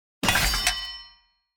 ui player morgenstern break

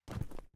mobs landings

